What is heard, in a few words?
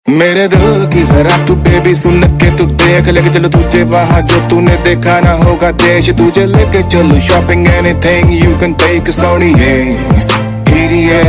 Ringtone